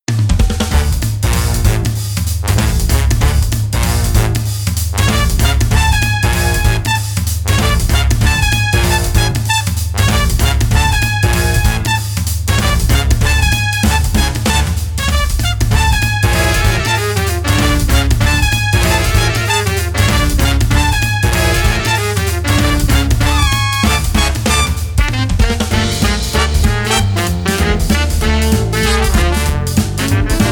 Big Band

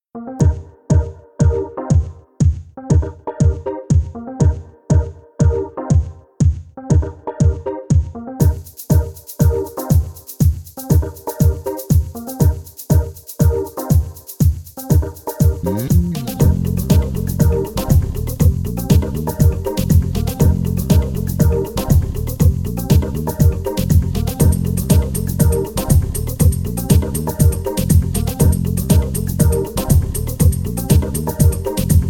trance mix

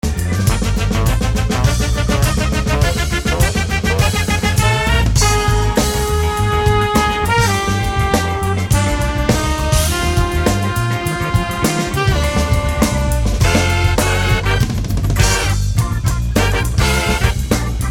funk outro; oaktown

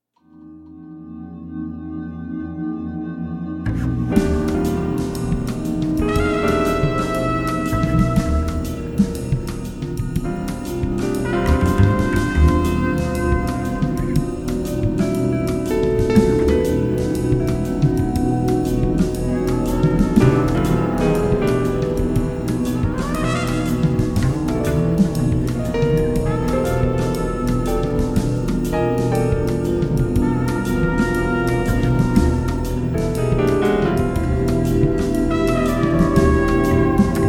Spooky Jazz

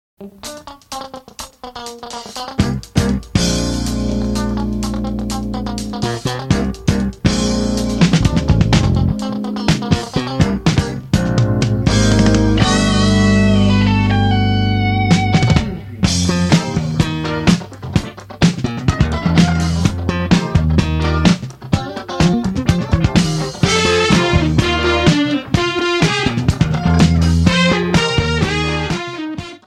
jive jam; bolvinstudios; the lost recordings; rhino

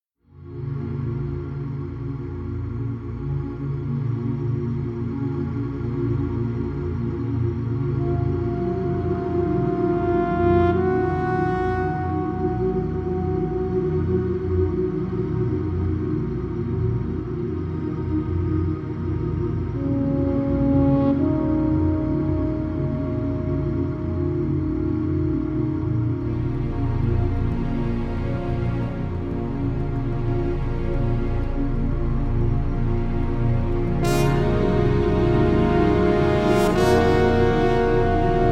Haunting Pad

